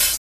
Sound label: thin pedal hi hat e key, Royality free foot cymbal tuned to the E note